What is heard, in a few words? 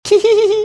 Teeheeheehee